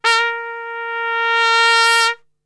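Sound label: A#2 trumpet; Best Service; Brass Super Section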